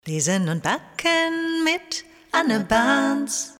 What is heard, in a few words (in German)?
Jingle; Lesen und Backen mit Anne Barns